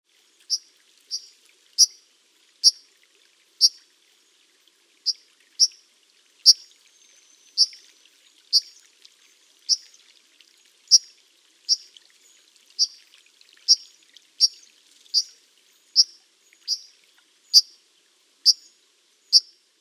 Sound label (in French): cincle plongeur